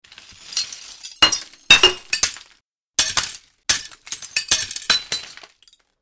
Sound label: glass break